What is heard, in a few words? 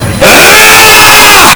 rahhhh; yba